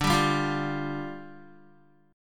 D Minor Double Flat 5th, down, Guitar; Dmbb5; strummed; JGuitar

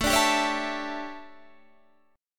D Major 7th Suspended 2nd Suspended 4th, chord

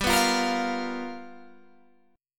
G 7th Sharp 9th G chord, Guitar Open G

